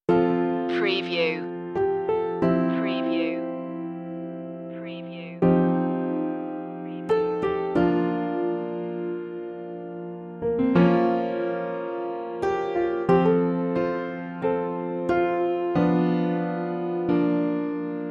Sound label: no perc